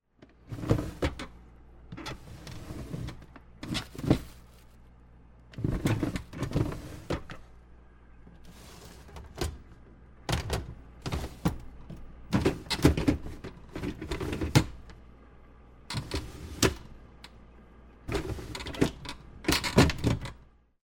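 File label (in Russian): выдвижной отсек в холодильнике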